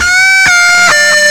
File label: SOLO GTR, GUITARS KIT; Big Beat Samples & More; AKAI